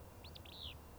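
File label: willow flycatcher; Traill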